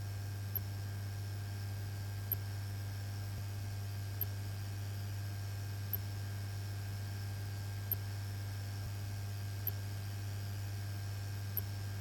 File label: Couinnements Squeakings; acoustic comfort benchmark